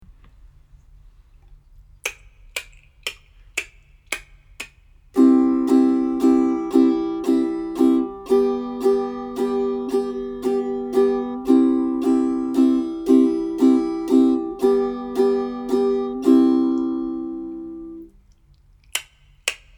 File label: C and G Chords in 3/4